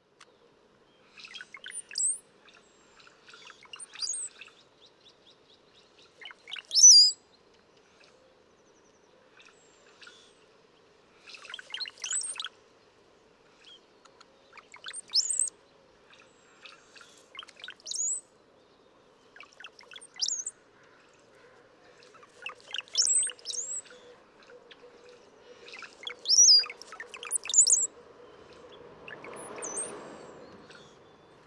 Brown-headed Cowbird

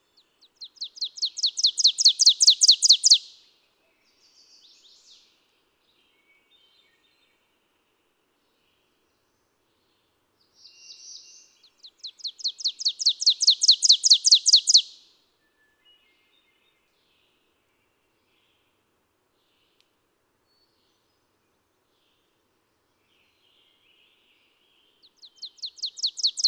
Ovenbird

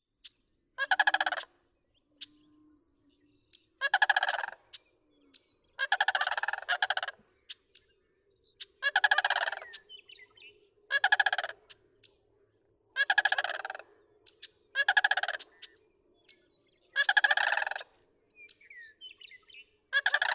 Sandhill Crane

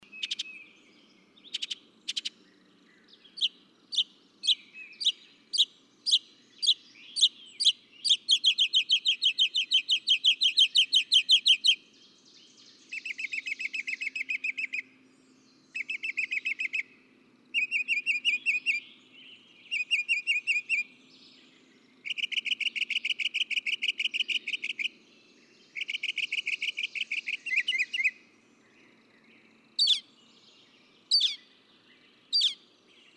Northern Mockingbird

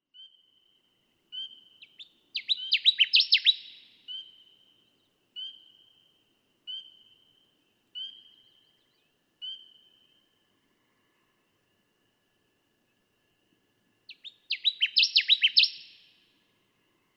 Connecticut Warbler

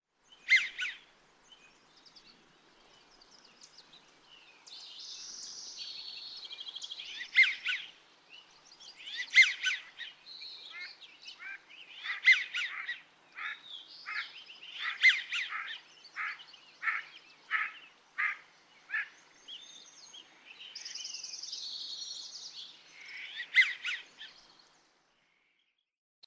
American Wigeon

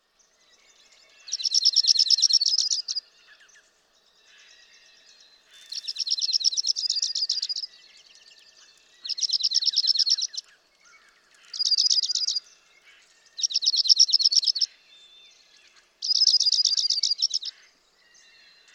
Dark-eyed Junco; Listening for practice singing during spring.

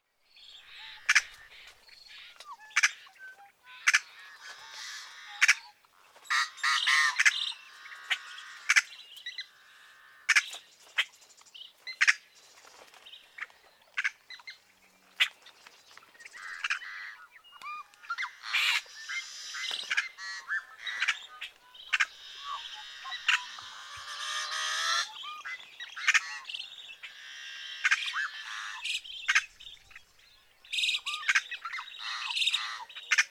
Yellow headed Blackbird

